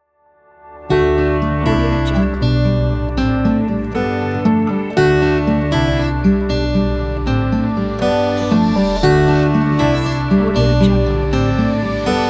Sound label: elegant acoustic logo